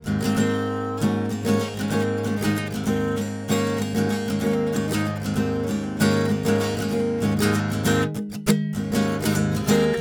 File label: AC GTR All 3; 97 Percent Fire Studio